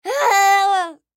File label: Human Scream; Yell Male Seven Yrs Boy; Yelling; Sound Effects:Voice